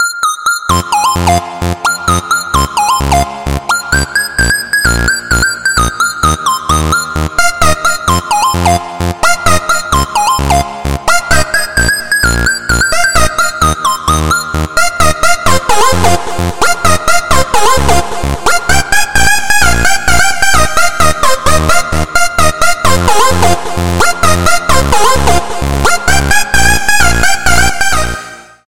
Electro

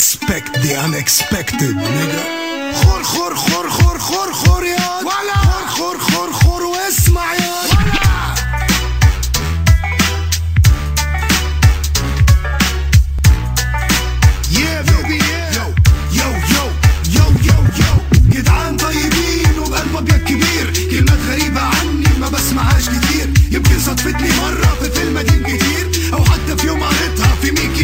Arabic Rap

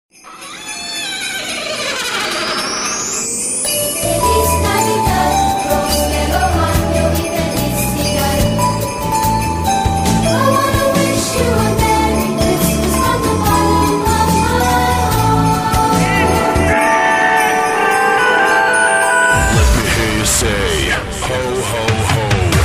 Xmas Rock Mix

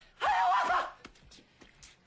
Scary Movie; Scary Movie 2

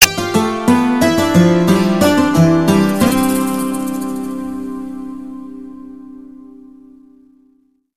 Nokia tune; iran styl

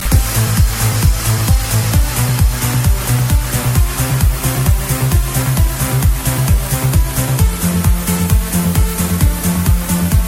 Strings

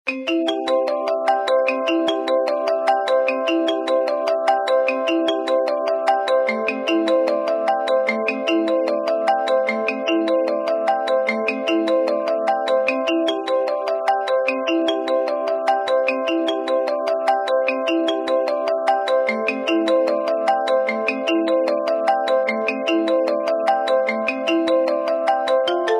Office Tone

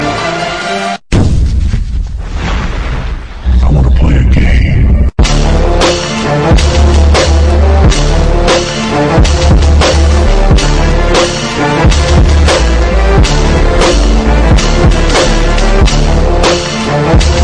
Remix

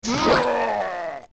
Iron knuckle death

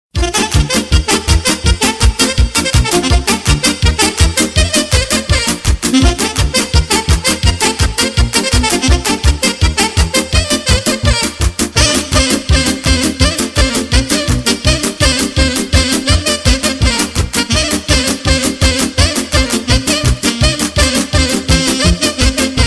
Romanian Sax

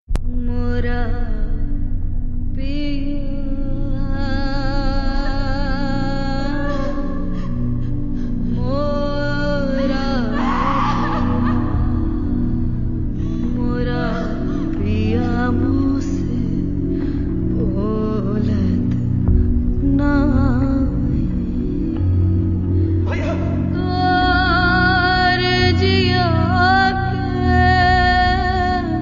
Female

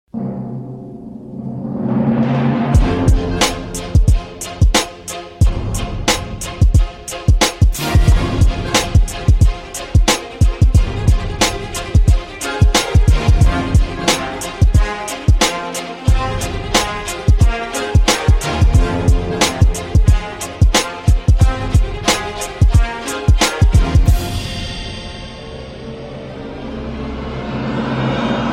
Hip Hop Beat

